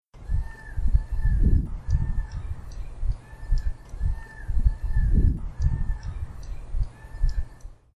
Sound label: Peacock call